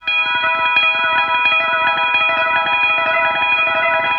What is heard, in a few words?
Stretchy, Guitars; Total Drum&Bass